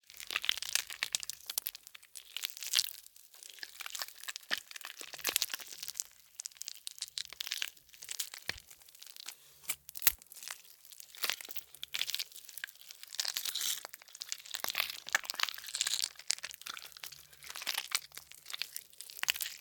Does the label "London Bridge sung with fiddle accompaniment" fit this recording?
No